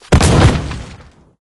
Cast Jump; endless world idle rpg gamerip 2019

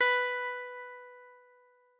B Elec Guitar; Notes; Scratch

